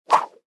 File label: cable thin single whoosh whip; UltimateFishing